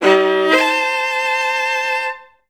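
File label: violas A3; Best Service; Peter Siedlaczek's Advanced Orchestra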